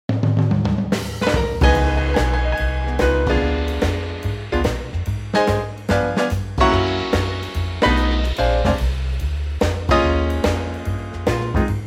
Bb trio; key - Bb - vocal range - Bb to D

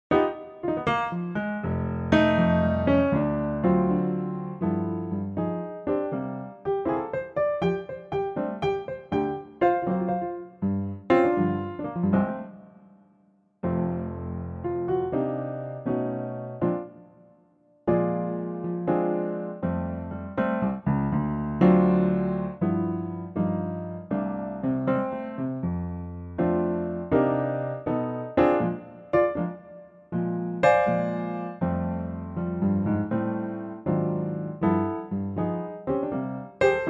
F piano

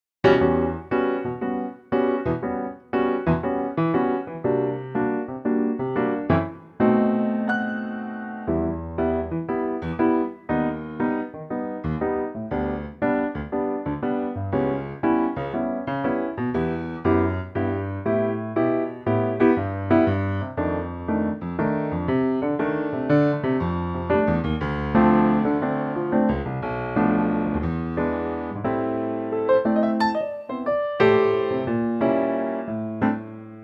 piano; key - Em; Piano only arrangement of this classic standard, lightly swinging and perfect for an intimate vocal

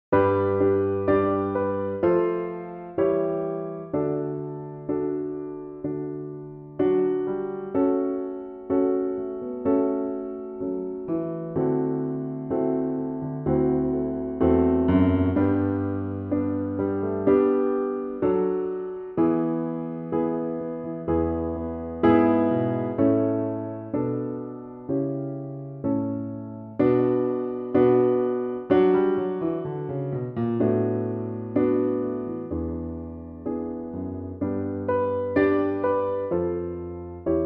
D piano; key - D - range - A to A; Piano only arrangement; Pianotrak

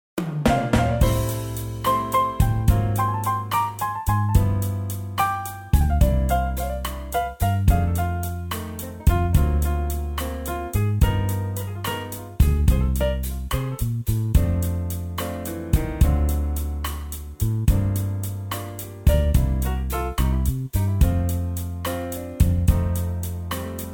F blues

